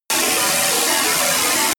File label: sci-fi digital transmission noise